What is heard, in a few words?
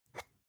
spice jar scratch tonal open fast